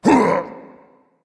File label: grunt hit dragon; fafnir